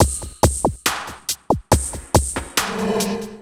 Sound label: SloMo Beats, Loops 140 BPM